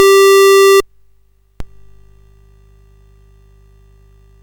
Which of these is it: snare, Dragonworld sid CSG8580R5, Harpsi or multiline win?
Dragonworld sid CSG8580R5